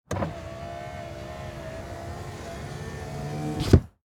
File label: Transportation Cars Honda Civic Roll Up Window Exterior